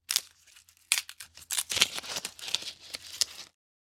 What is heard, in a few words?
Hand peeling fruit; cooking